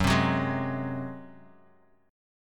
Gb Suspended 2nd Flat 5th, Guitar, DADGAD; 4,3,4,1,x,x; down; chord shape

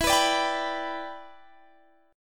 G Major E; strummed

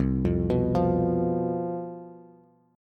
C Major 7th Suspended 4th, down, Bass; arpeggiated

